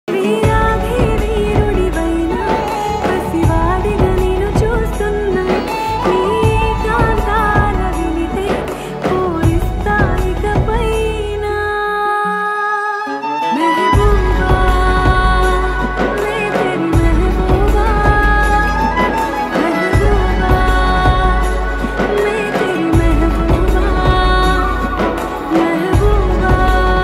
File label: telugu ringtone